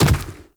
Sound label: Inf Medium Land Dirt, Footsteps, Enemies, Infested